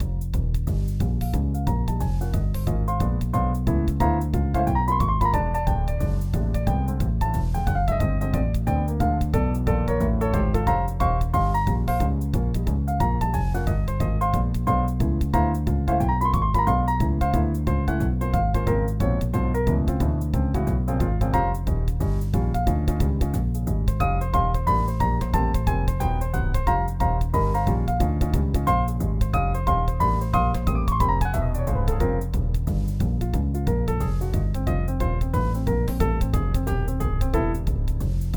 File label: jazz